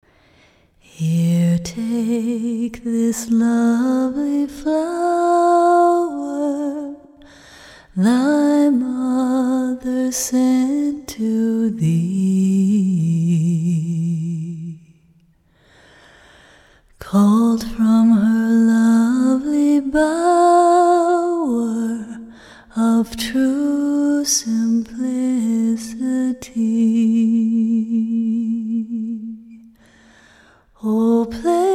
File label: usa shaker